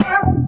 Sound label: E stab; Loopmasters; Funky Underground Vol 2